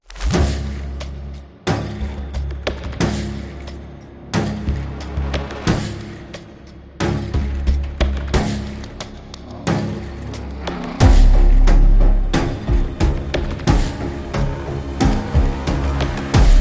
Ritual Horror Trailer

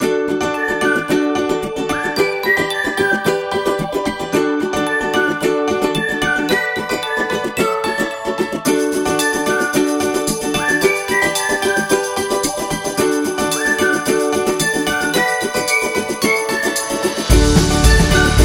Happy Music

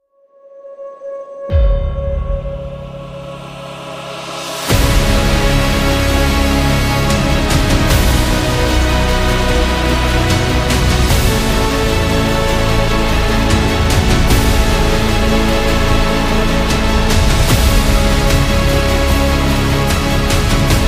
Trailer

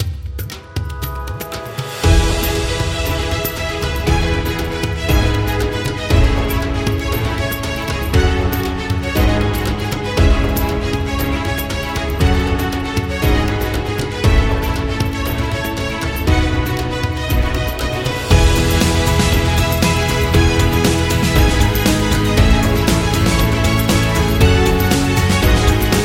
Epic India

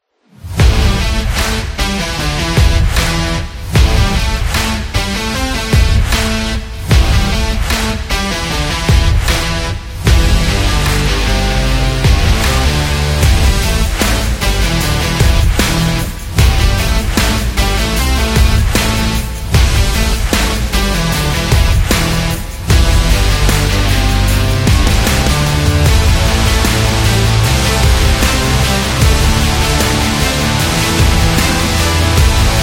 Big Beat

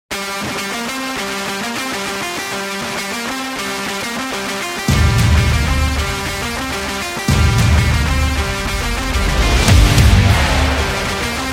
Stylish Energetic Rock

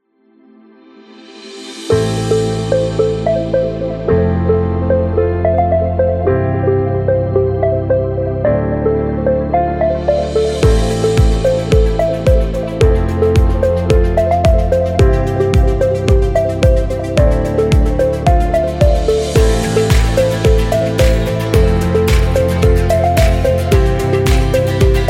Corporate Modern

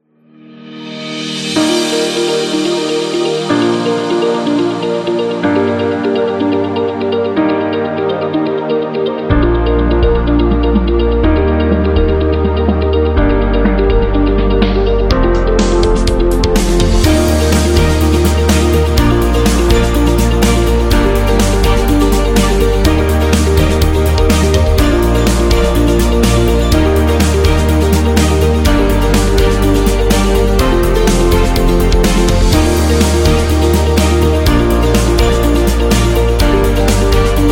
Corporate Dynamic

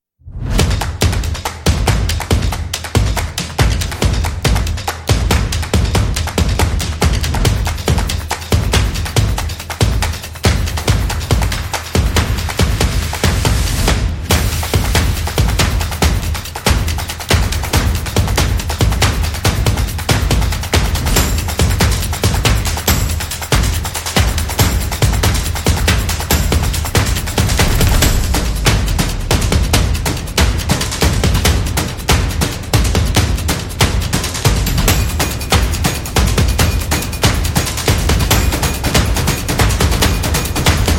Fast Sport Drums; action drums percussion

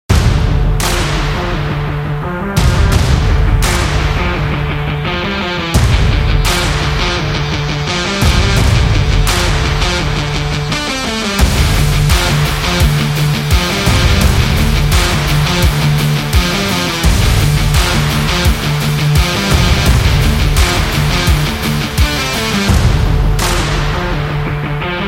Sport Action Rock

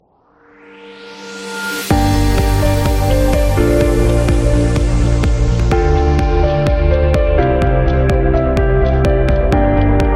Luxury Beat

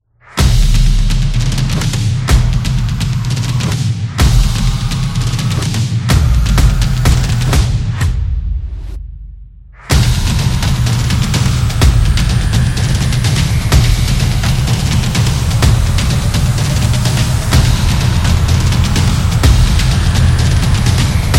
Sports Drums

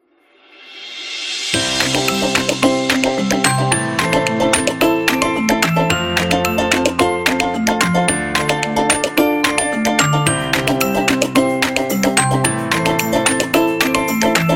Happy

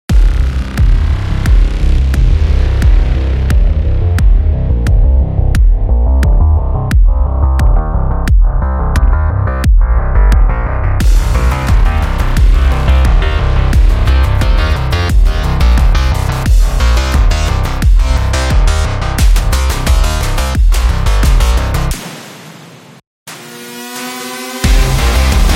Cyberpunk

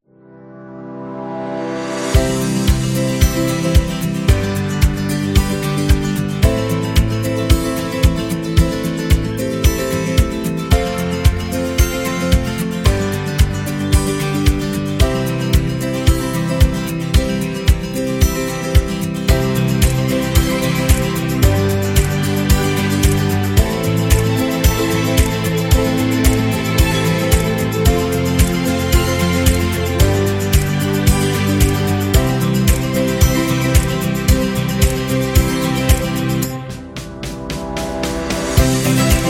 Upbeat Wedding

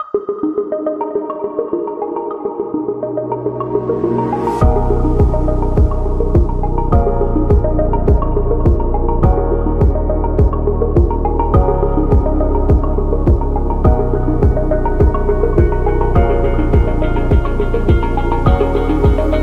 Luxury Dynamic

